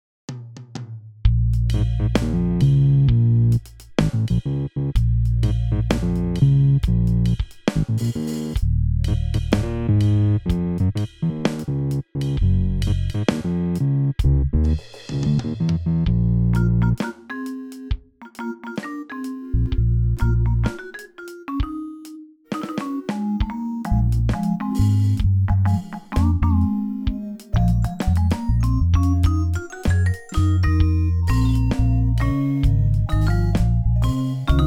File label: paper route groove